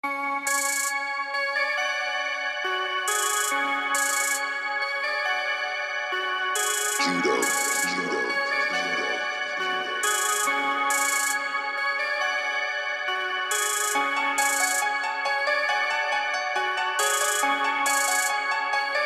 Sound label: Instrumental